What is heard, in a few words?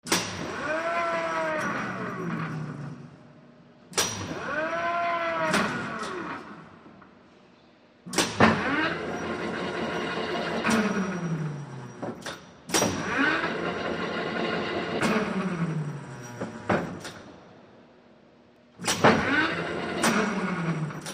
Crane Motor Movement, Cu Perspective, Starts And Stops, W Clanks And Whine.